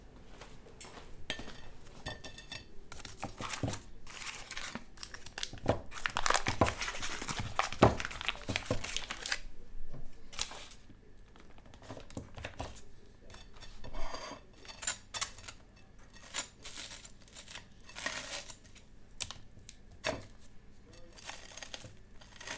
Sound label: Kitchen toast